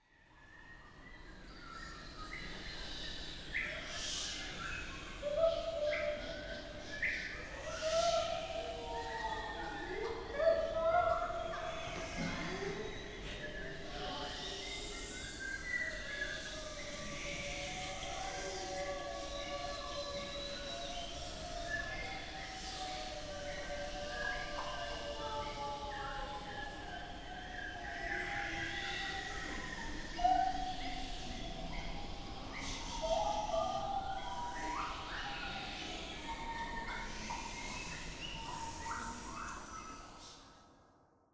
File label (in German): Atmo; Requiem; Presse